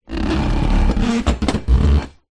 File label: household glass stove top wipe clean sponge squeak metal hobs rattle